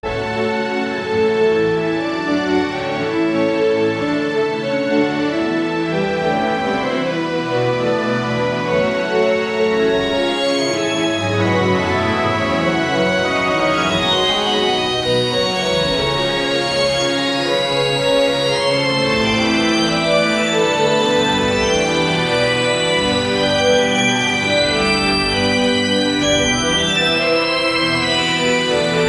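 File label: whimsical orchestral fantasy, adventure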